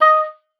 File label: oboe, D#5; Xsample VOL-6